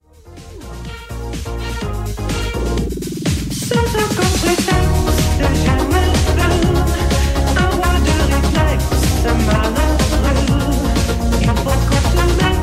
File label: party Music